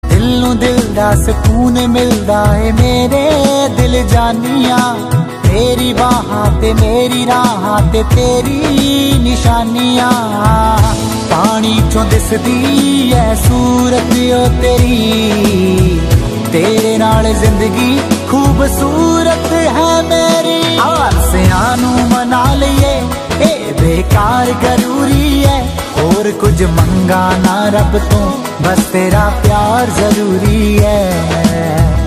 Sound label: Punjabi Song